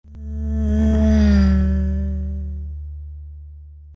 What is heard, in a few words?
Doppler